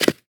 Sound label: Short foley